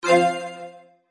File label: win